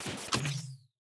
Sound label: power switch deactivate; factorio 2.0.55